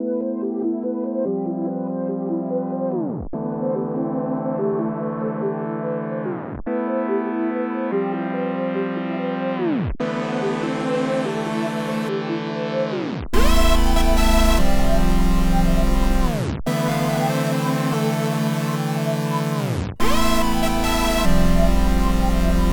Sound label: 144 BPM A Min; Zoo; Wavy